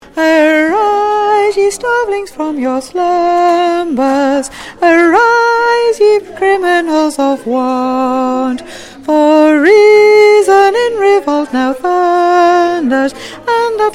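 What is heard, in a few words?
Soprano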